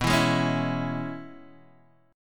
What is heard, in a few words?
E 6th, B